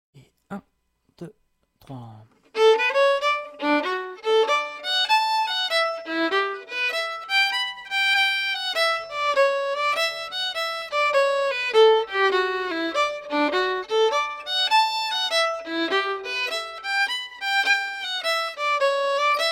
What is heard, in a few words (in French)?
melodie lente de l'anglaise en re, violon